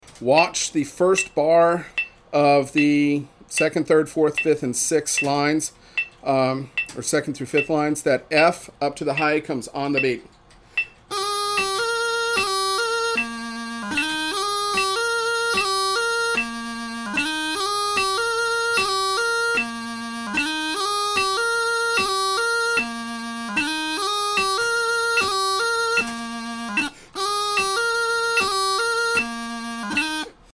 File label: 75bpm, bagpiper; Brown Haired Maiden